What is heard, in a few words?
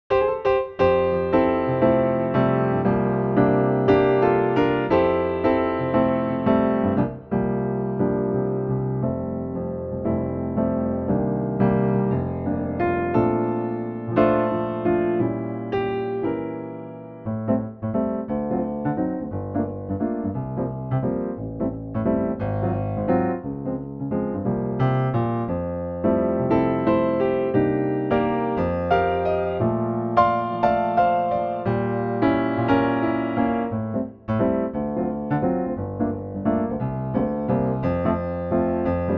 F piano; 3/4 Piano; key - F - vocal range - C to D